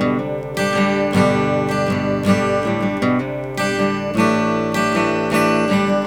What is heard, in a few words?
acoustic wow